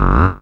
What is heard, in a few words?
bass, f#, Talking Bass; Dance Synths